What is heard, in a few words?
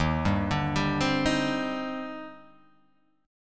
Eb Major 13th, EbM13 chord; arpeggiated; JGuitar